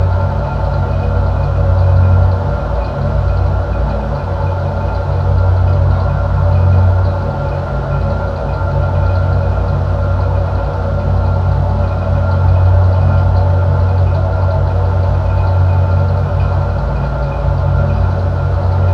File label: metal pipe hum